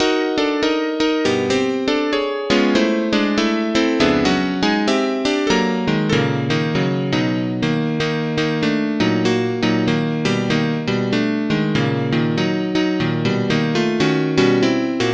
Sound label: piano